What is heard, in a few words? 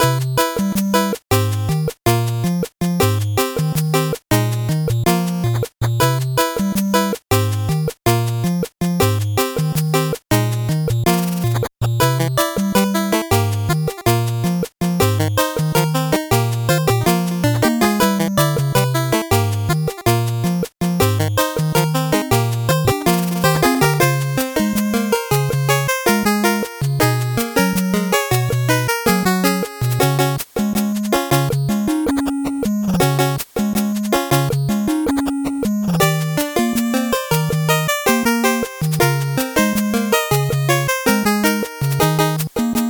8bit bgm slow